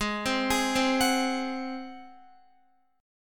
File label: Ab 7th Ab Guitar 4F,4A,5C,5G,5C,6E; x,11,12,13,12,14; arpeggiated